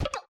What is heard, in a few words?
combo Miss; HeavenStudioPlus; karateman